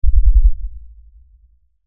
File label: Lfe Pulse Low Bass; Drone; Sound Design